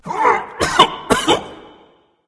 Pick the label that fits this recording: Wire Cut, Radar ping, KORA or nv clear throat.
nv clear throat